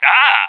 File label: whack popup; cstrike; 35hp ruso